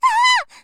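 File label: girl scream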